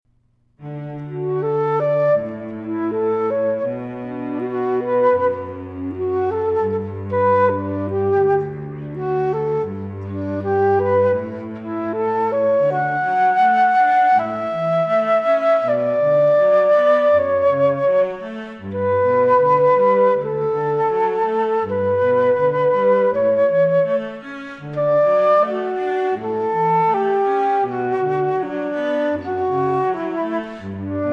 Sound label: Flute And Cello Duo; Canon in D (Pachelbel)